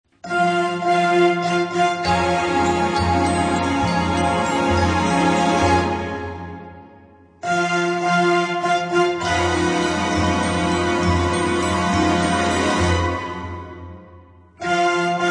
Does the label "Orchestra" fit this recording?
Yes